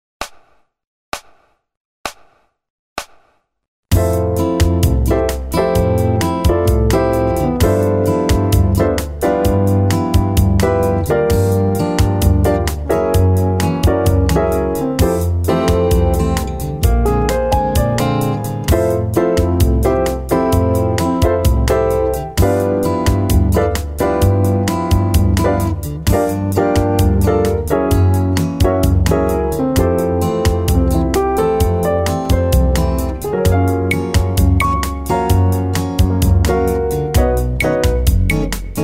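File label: Latino playback saxophone; MyVirtualBand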